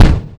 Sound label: Kick, drums; Sonic Foundry (Sony Creative Software); Drums & Drones; Construction Kits; Stream; Steam Kit